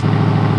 good car

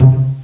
pizz c3; Hexen Demo; Hexen Music; Hexen 6vox

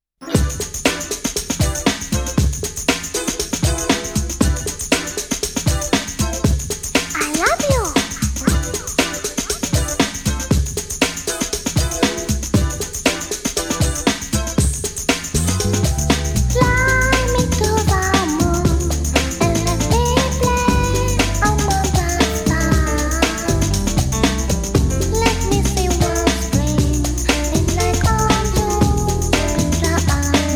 bossa techno; Neo Genesis Evangelion

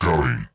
Gorilla; PC Gamer IT CD 41; machines demo

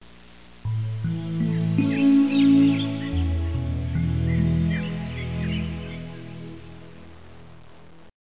Birds; Broderbund Print Shop Multimedia Organizer Deluxe Edition

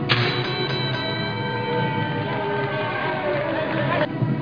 Boxing Ambient; FIGHTERS; loLevel

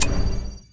click jewel

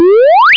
Electric Slide; Short Circuit

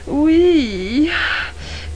Pop

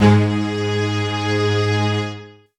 Strings C G#2; Cyclick Seduction; Kit C; Multisample

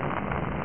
Flame; stryker

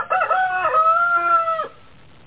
cock crowing

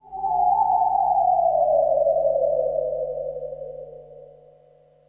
ghost

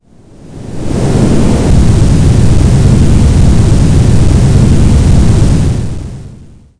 tidal; Panic Volcano; Water

